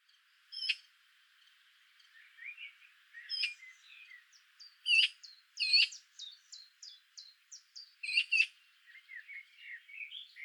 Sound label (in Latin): Pica pica; SETE